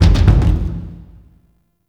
Ur Lose Piece; UI; Minigames

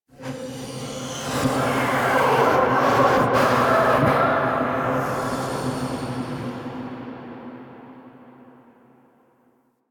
Misc Whispers Large; Warframe; Ambience; Entrati

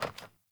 Sandals Walk Metal, Footsteps; Characters; Player

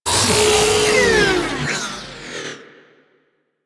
Proto Infested Mutalist Death, Vocals; Warframe

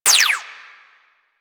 Fire Shot, Weapons; Warframe; Boar Prime

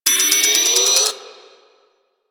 Moa Self Destruct Charge; Warframe; Quests; The New War; Veso

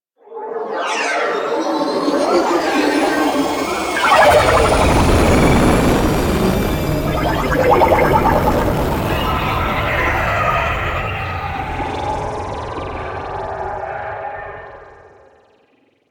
Insect Liset Relay Intro Docking, Vehicles Flight

